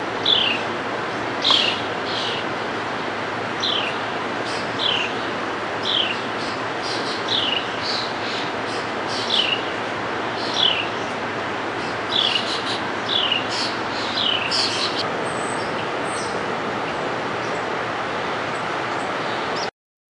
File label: Carolina Wren